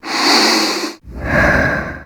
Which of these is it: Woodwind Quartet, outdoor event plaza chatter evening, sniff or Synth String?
sniff